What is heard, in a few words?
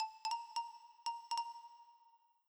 nokia ring, midi tones